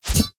sword swing

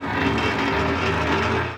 metal door movement

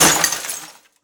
plastic debris crash

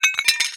ceramic plate movement

